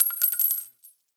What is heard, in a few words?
AK47 Shell Casing; Warframe; Tenno; Weapons